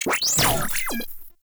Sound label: Tank Airburst Chirp Spawn; Enemies; Weapons; Lasrian Tank; Warframe